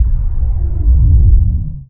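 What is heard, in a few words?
Laser Fire Interior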